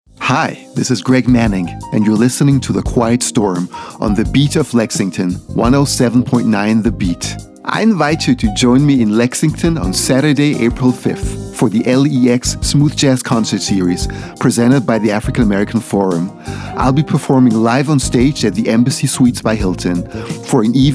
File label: SmoothJazz quiet storm; Artist Drops